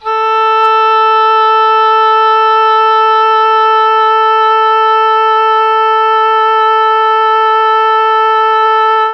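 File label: A2 oboe, chamberlin; CHMBLN OBOE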